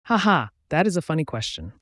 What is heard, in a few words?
haha